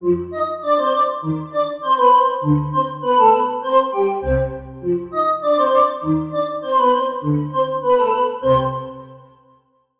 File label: Woodwind; Wish Spell; Routed Sound; Vital Expansion - Story Walker Vol.1